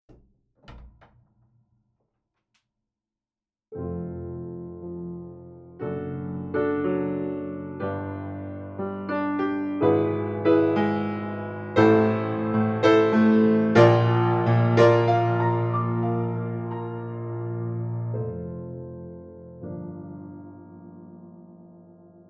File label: Basic Lift FX and Main, steinway concert grand